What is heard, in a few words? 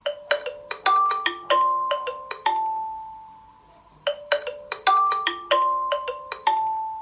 xiaomi ringtone; mountain spring